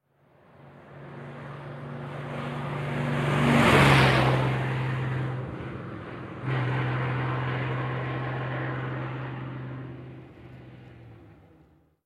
Dodge Power Wagon Fire Truck, By Medium Cu, Fast with Gear Shift On Away.